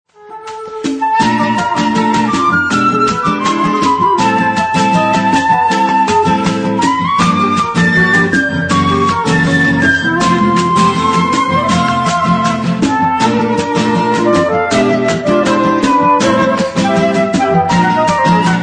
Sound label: 2 Flutes Guitar